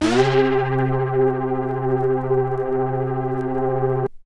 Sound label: CAT Bent LFO Vinyl Synths C2; vinyl leads